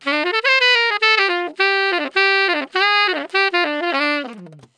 tenor3, solos

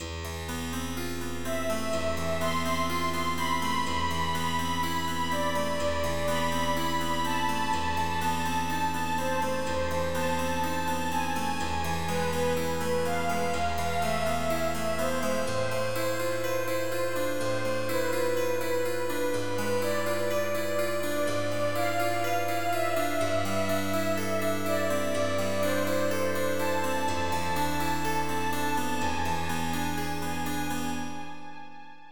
tune

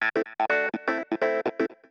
synth house 125 A; eJay; Keys

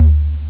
knock; OctaMED SoundStudio V1

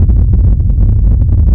Afterburn Loop; Phoenix

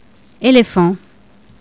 ELEPHANT

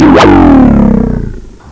phaser, electronic sounds; Sound Effects for Multimedia - Volume 1

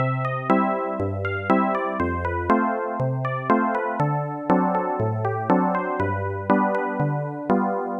RAGTIME

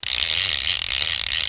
GOOD ratchet; PUZMANIA

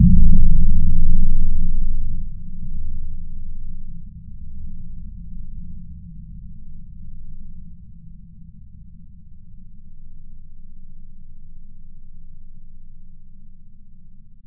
woodblocks; fractal musiclab; instruments

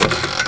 register

